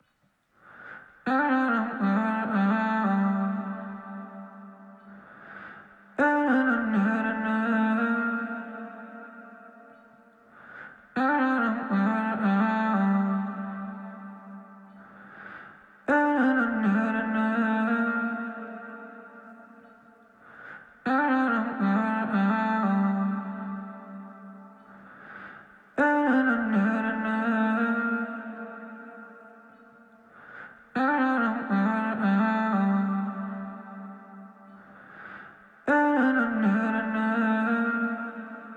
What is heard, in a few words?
vocals, 97 BPM G Min; Desires